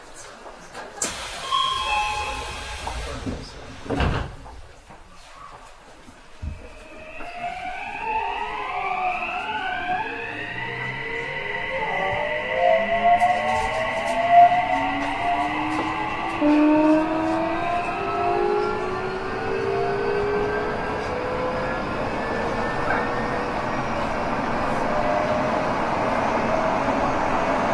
odakyu 3000, train

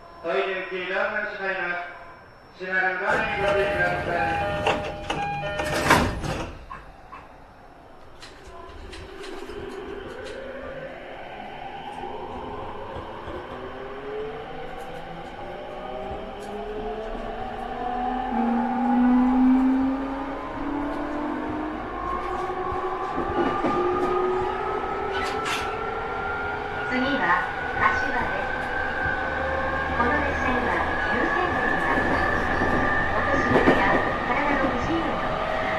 joban rapid narita E231, train

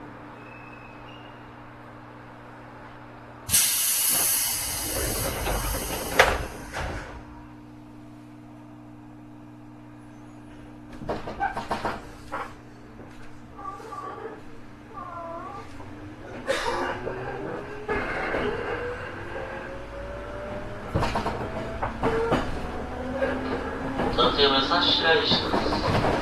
tsurumi 205, train

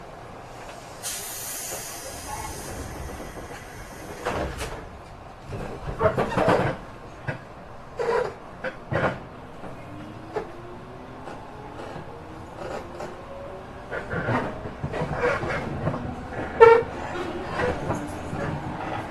yamanote 205, train

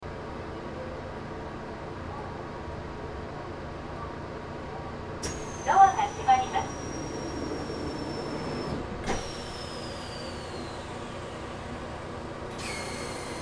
chuo E353, train

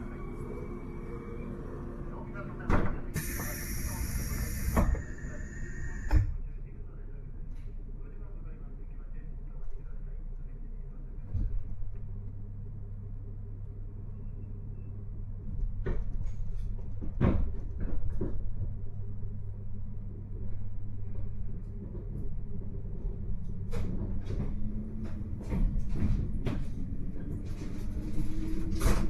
tohoku 485, train

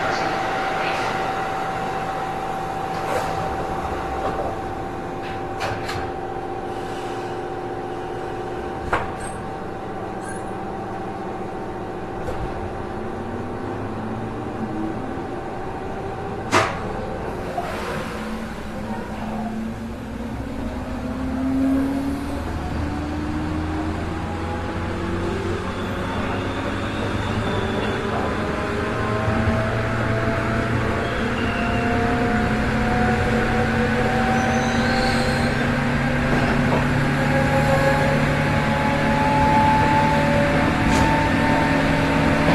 joban rapid narita 103, train

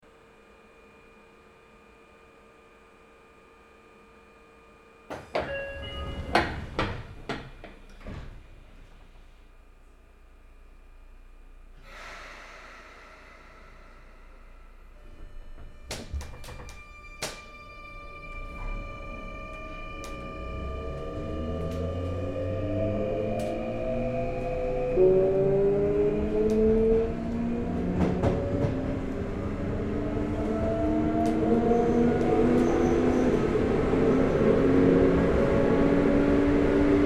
sotetsu 9000R, train

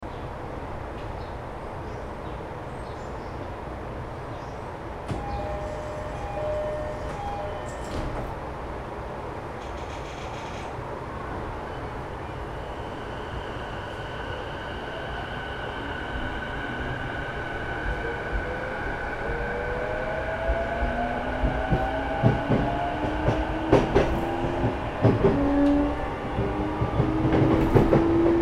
sotetsu 12000, train

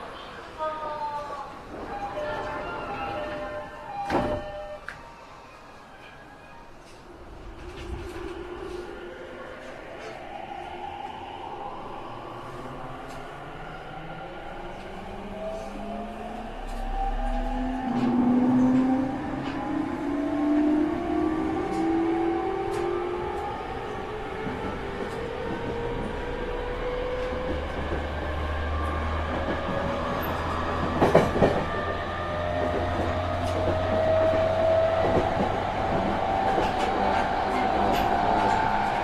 chuo sobu local E231, train

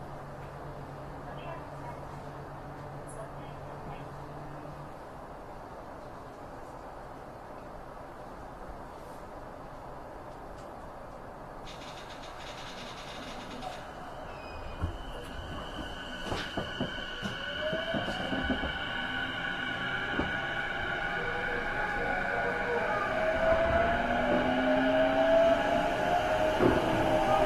chuo rapid E233, train

